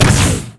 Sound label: basic attack; lucian skin01